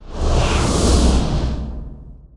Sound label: Music Frontend